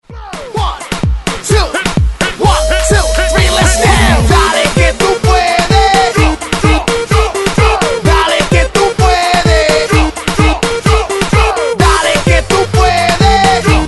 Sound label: Mashup HYPE INTRO 128 Bpm; DJDOLLS